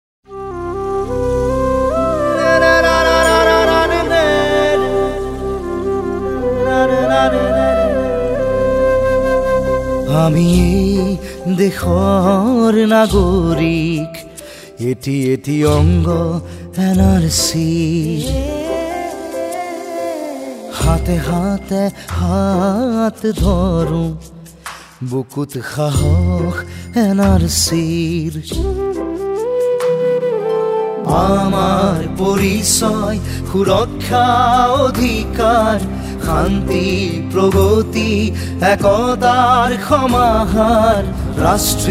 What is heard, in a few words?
jingle assamese